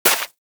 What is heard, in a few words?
Bullet Impact Debris Snow